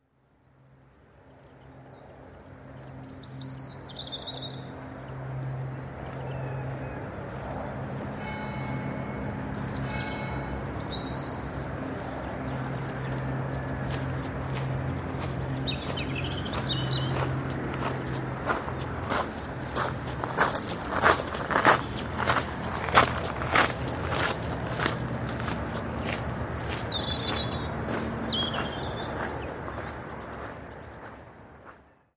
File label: belton house walking on gravel and birds